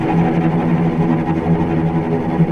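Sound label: cellos tremolo E2 piano left, string; East West - The Ultimate String Collection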